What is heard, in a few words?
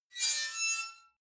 metal squeal bright high; metall